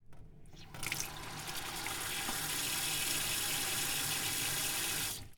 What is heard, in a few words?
Opening tap water sink